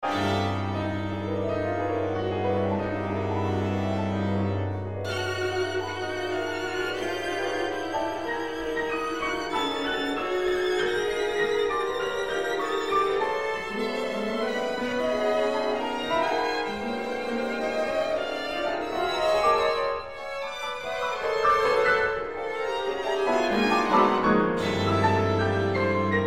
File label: Piano Quartet